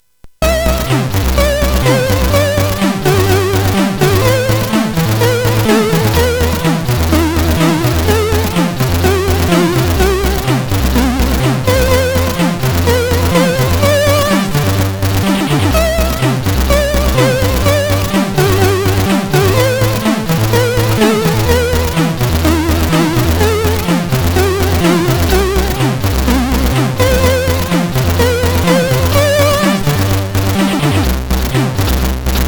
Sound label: Russian Anthem sid CSG8580R5; hvsc